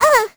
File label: hurt; Crypt of the NecroDancer